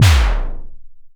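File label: snare, EMS VCS3 Drums; Polyend; Simulacrum Sounds; 1 Shots; Synths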